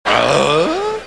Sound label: tag grunt